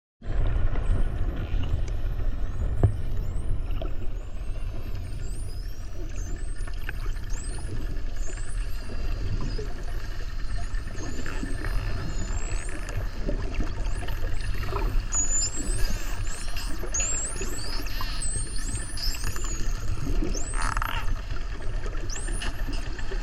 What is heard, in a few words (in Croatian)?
Delfini zvuk